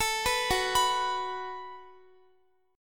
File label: F sharp Minor Double Flat 5th, Guitar; F#mbb5 arpeggiated; x,x,19,16,18,18; 4E,4A,5D,5G,5C,6F; chord shape